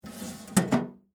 Mail Box Close, Household, Mail, Box, Close, Metal; Miscellaneous Household Sound Effects; BlastWave